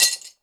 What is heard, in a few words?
Glass